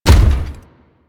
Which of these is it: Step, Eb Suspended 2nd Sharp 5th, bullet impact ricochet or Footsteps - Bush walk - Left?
Step